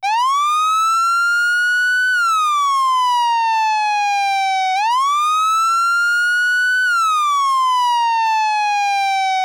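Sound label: Federal Signal PA640 Priority, Siren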